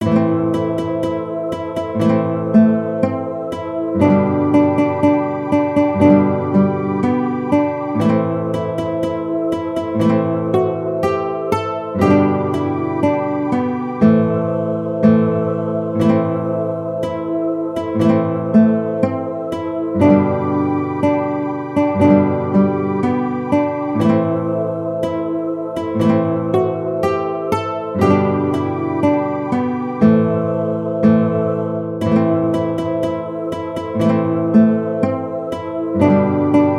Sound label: Drunken Sailor Guitar